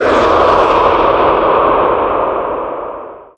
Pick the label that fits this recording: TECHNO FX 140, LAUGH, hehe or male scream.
male scream